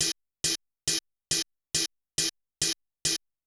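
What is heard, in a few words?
bass drum hi-hat, Techno 138 BPM; Best Service ProSamples vol.54; AKAI; HELLS DOOR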